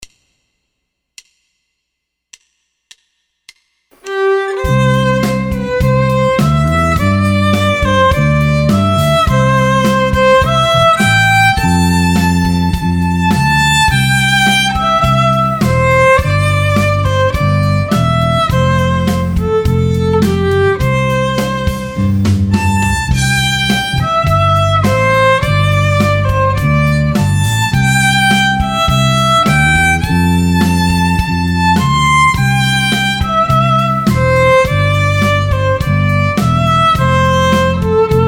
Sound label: Playalong Auld Lang Syne